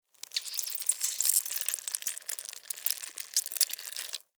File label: Larva Queen Eggs, Larva, Queen, Lay, Egg, Movement, Slimy, Wet, Insect, Bug; Larvae; Sound Effects:Animals:Insects; Blastwave